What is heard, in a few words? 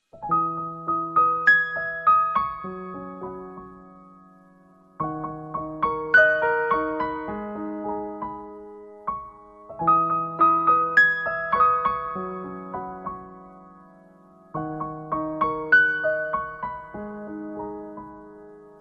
sad piano ringtone